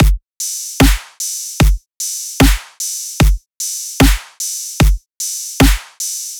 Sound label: Dubstep 150bpm Full; EDM Drums; Drum Kits; Stems; Ghosthack; Drum Mega Bundle; Ultimate EDM Drums; Dubstep Kit 16